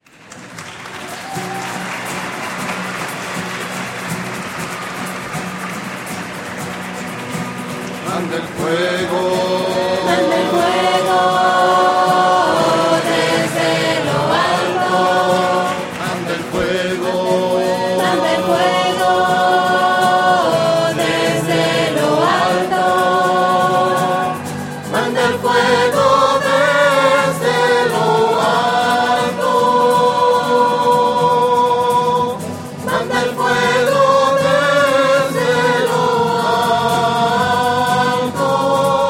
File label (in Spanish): voces; misas 2012